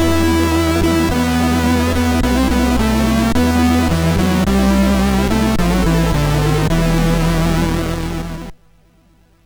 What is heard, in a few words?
Supremacy Jingles; MOS6581R3